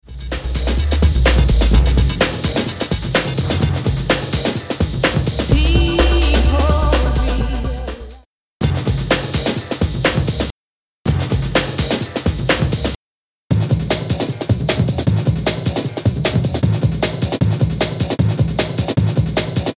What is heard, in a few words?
Acid Break - Beat